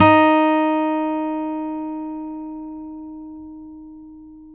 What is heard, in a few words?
STEEL F D#3, GUITARS; Synclavier Sampler Library